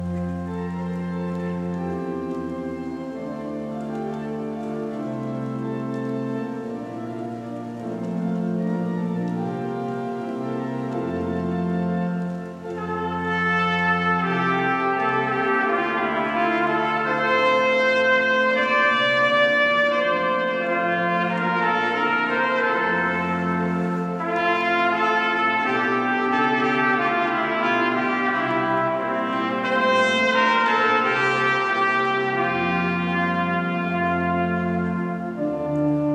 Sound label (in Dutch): met trompet